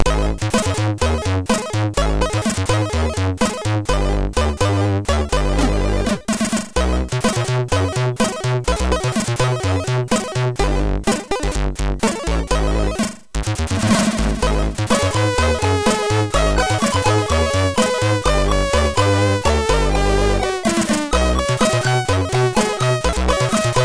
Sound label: sid MOS6581R3